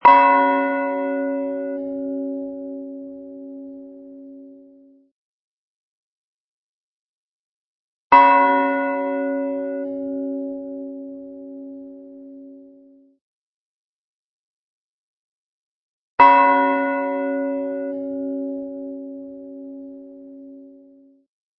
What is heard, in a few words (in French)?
cloche